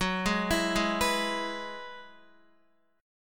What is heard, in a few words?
Gb 7th Suspended 2nd Suspended 4th, Guitar; Gb7sus2sus4; arpeggiated